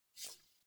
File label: UI Corpus Theme Grid Open; DE; Warframe